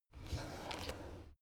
drawer cabinet crammed open soft halfway

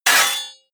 hit metal sword medium

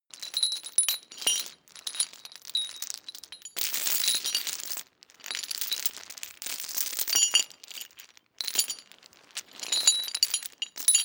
metal chain movement

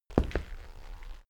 step wood step

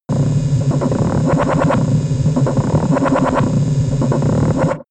space alien machinery

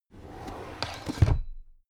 drawer cabinet crammed close regular

